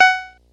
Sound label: F sharp 4; banjo